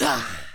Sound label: Player Hit